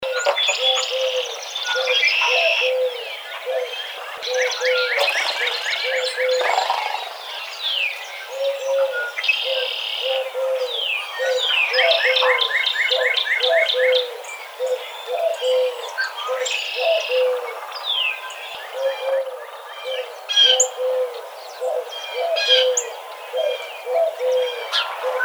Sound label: Eurasian Collared Dove